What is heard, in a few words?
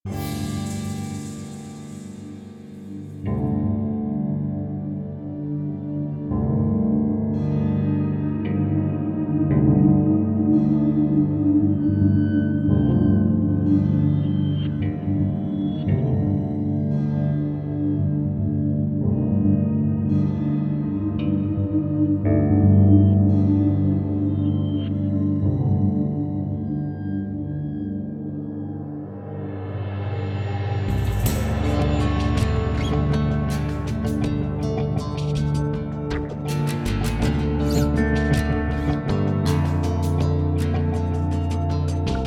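ambient guitar; the walking dead survival instinct gamerip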